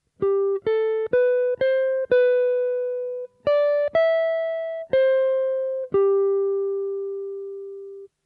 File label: chord melody guitar